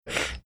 death rattle

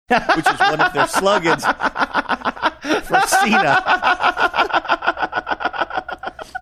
having good laugh

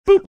boop

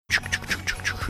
math processing mouth sounds

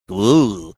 ooooo

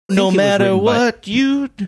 singing no matter what you do

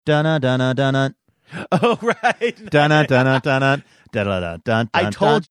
singing portion; super mario

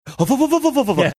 making series funny food too hot

